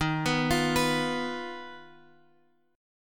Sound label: D sharp Suspended 2nd Sharp 5th D sharp Guitar Open F; arpeggiated; JGuitar